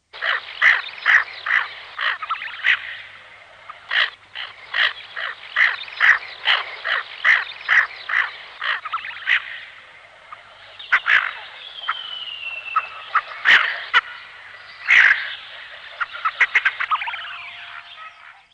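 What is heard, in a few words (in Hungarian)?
Kontyos réce, Madarak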